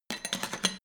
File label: hammer indoors handling